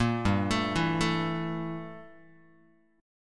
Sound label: G Minor 6th Add 9th, Guitar; arpeggiated; 1,3,0,2,1,x; 4A,4E,5A,5D,5G#,6C#; JGuitar